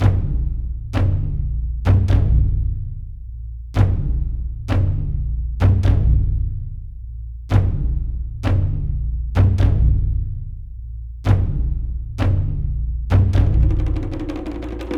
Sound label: Drums; For Honor